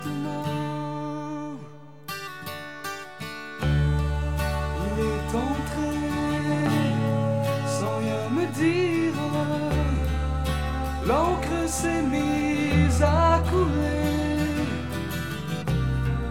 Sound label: Harmonium; French Pop